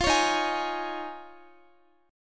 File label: Eb 7th Suspended 2nd Suspended 4th Eb, Guitar; 4F,4A,5C,5G,5C,6E; strummed; x,x,17,18,15,16